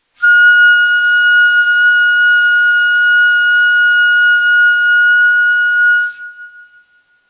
Tiz Hisar, Neysample

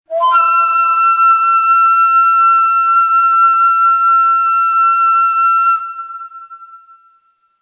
Tiz Neva, Ney

